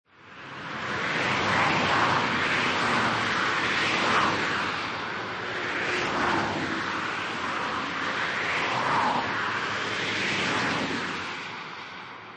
Motorway Or Highway Traffic, Light to medium traffic; Light to medium traffic on motorway or highway. Small cars driving by at high spe